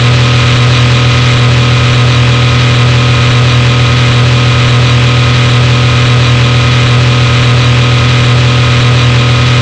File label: engine